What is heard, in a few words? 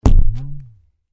Cartoon Rubber Band Stretch Twhap; Miscellaneous Cartoon